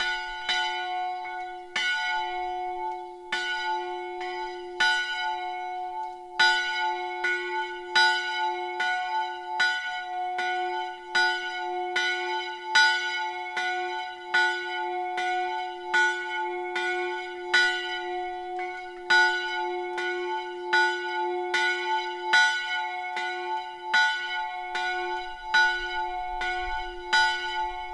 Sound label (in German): Glocken